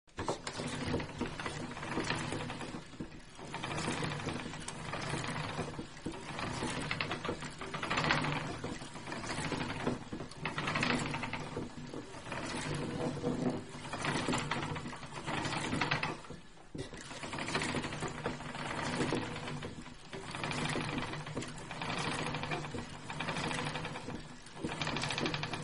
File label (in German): spinnrad